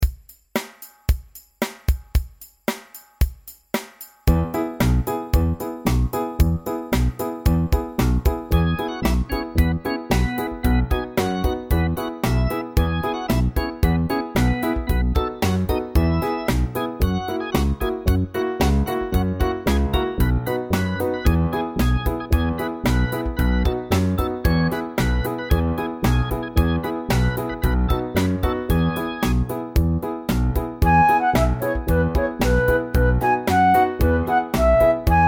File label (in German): tiefes Playback